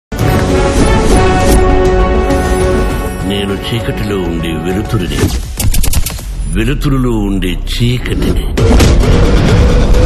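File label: teaser background music; son of india; telugu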